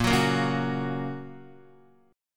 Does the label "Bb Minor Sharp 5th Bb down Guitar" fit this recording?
Yes